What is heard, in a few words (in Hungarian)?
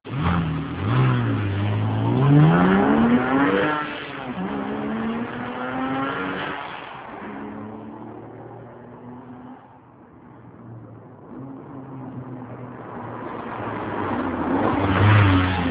sebváltás, porsche 911